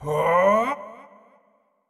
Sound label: WindUp Long; Marv